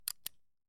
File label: Cowboy Revolver Cocking; SoundsCrate